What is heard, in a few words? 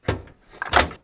Vehicle Hatches; Red Orchestra 41-45